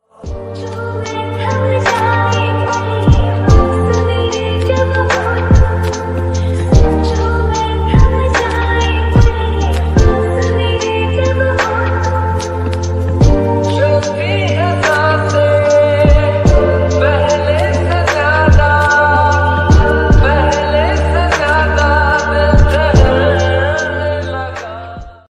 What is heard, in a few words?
romantic instrumental love ringtone